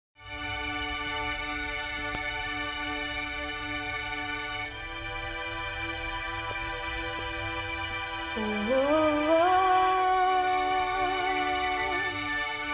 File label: singalong